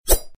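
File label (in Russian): vzmah mecha, sword swing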